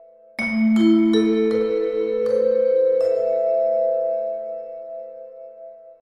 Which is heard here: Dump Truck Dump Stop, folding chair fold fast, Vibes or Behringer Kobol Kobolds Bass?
Vibes